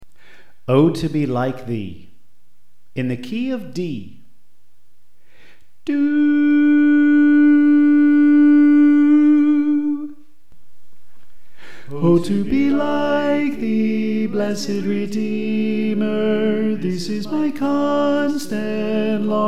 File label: Tenor